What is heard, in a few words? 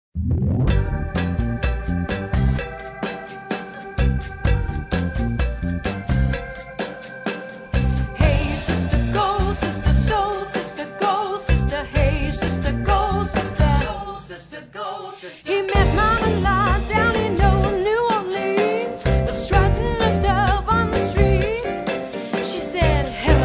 vocals